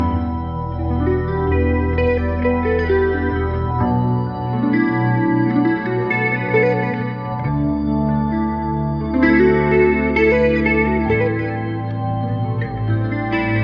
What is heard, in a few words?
keys and guitar the saddest funk; Loops